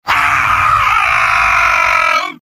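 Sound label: soldier m pain critical death; tf2; garrysmod; mvm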